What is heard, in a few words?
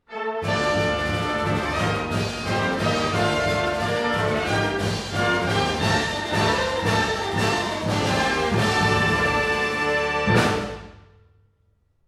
Fanfare; 学生歌